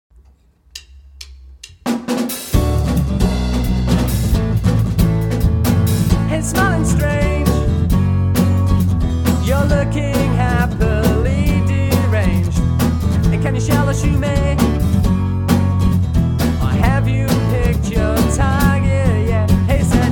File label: Acoustic